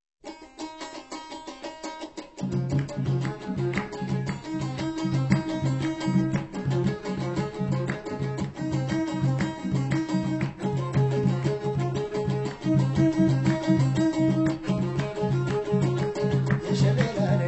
Harp; World Sounds; Apollo

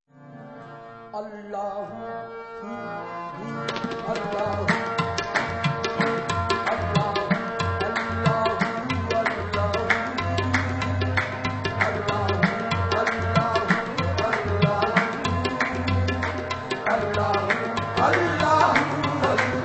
Vocal Sufis; World Sounds

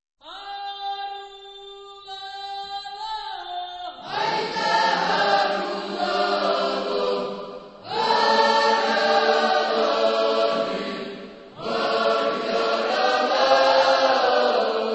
Georgian Polyphony; World Sounds

